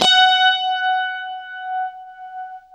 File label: UNIVIB F#4, Guitar; East West; Steve Stevens Guitar Samples Collection